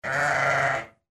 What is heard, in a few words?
sheep